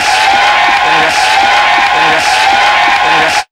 Big Beat Drumloops, 140

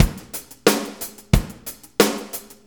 90 ROCK, DRUMS

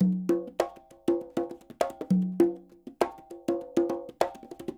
SHUF, Percussionworks; Sampleheads; NYC Percussionworks; SF LOOPS 100

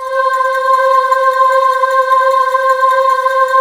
C5, Choir Acoustic & Synth; USB Soundscan vol.28 - Choir Acoustic & Synth; AKAI

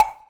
Wood, Block Stick; Equipped Music; Loungin House

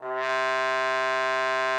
trombone C3; Xsample VOL-3

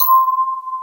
Electric Pianos; Wizoo Electric Pianos

